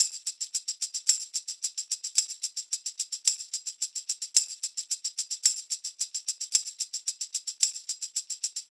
tambourine loop, 110; perc construction loops; SEISMIC Drum Sample Pack; Slate

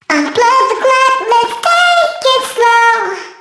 phrase Bb, Vocal

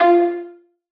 tonal oneshot F; Pop; Slate; PASADENA Pop Sample Pack; Tonal One Shots

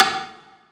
snare oneshot; SD PASADENA Pop Sample Pack; habit; Slate

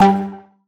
tonal oneshot G; Pop; Slate; PASADENA Pop Sample Pack; Tonal One Shots

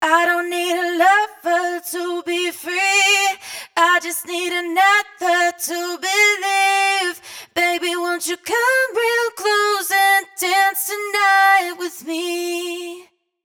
hook Dont Need 128 Fm wet, Vocal; Slate Digital Omnivox Vocal Sample Pack

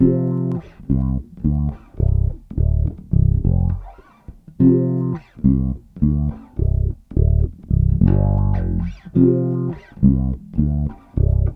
Bass loop 105 Dm, Electric Bass Loops Direct; Water; Slate Digital BASSHOLE Sample Pack

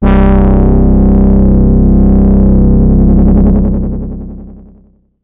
Synth one shot Long Nasty C, Bass; Synth Bass One Shots; Slate Digital BASSHOLE Sample Pack

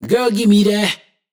hook Give me That Abm wet, Vocal; Slate Digital Omnivox Vocal Sample Pack

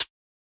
hat rattle, Closed Hat; Hip-Hop; Drum One Shots; SD CAICOS Hip-Hop Sample Pack; Slate

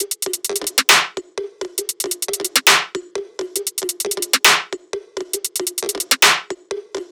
tops 135, Drum Sample Pack Loops Top Loops; SD SEISMIC; Slate

